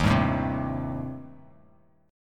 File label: D sharp 6th Flat 5th, D sharp, down, Bass Standard; strummed; JGuitar